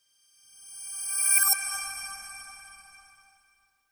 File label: Fairy Sparkle Whoosh